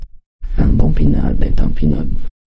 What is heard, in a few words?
jingle